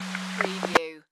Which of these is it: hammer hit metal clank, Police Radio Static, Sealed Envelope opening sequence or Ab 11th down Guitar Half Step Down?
Police Radio Static